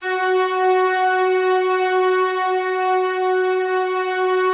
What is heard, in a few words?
F#3, STRINGS, Yamaha VP-1; Music Store; JODYSTRINGS